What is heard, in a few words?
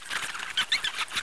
Bat